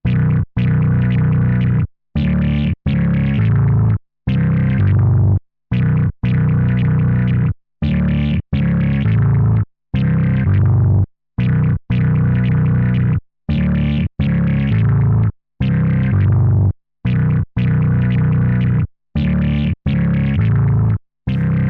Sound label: Korg MS20 Ext In Bass Loop CV2 LP HP Resonanz